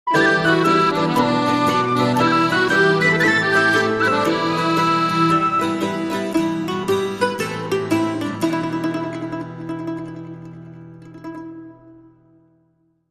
Young Men Marching, Sting

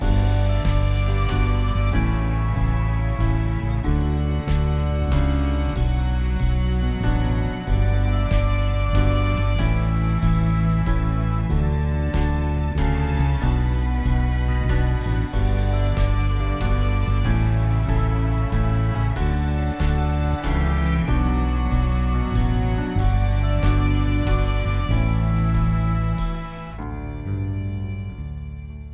Orchestral, Inst

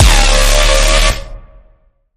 Bend, C sharp

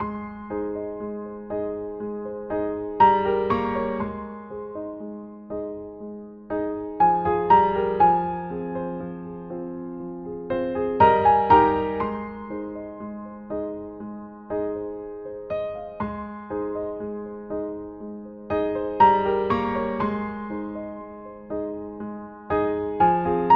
Light Breaks Solo Piano

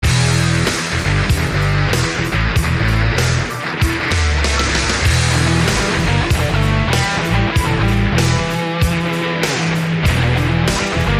Rock

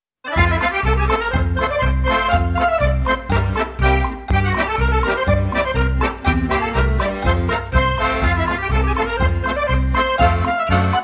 Jingle

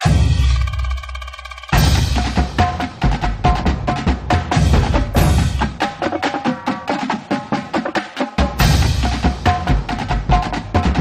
Snare Sync

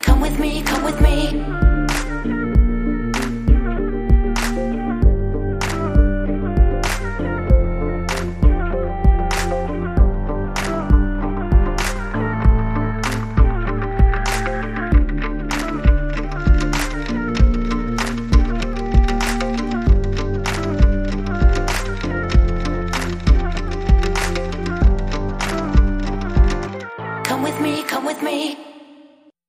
In And Out Light Beat Hook

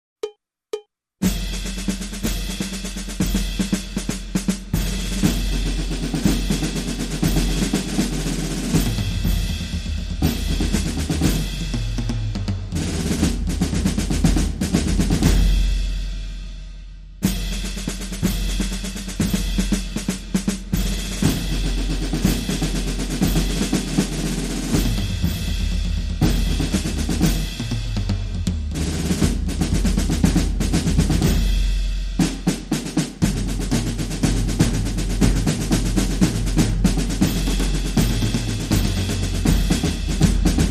Military Band Loop

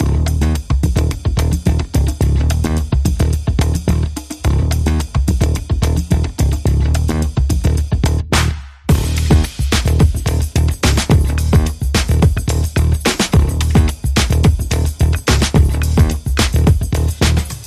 Drums and Bass Only

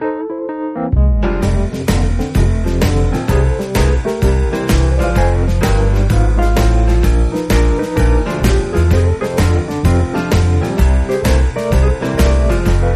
Browny Shuffle

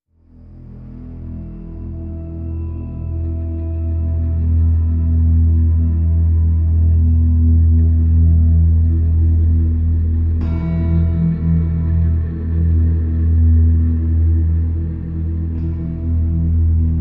E minor No Guitar

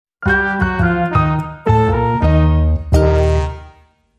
Stinger

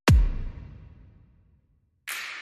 Overdrive Drums

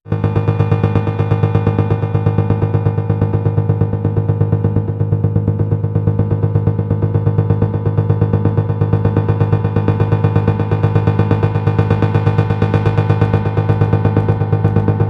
Helicopter Pulse

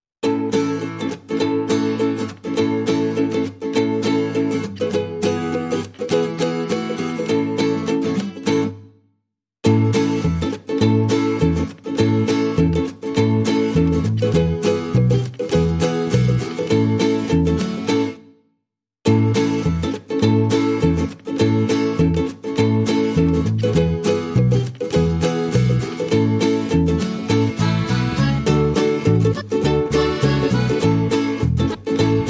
Underscore No Drums

